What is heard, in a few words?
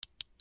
CLICKS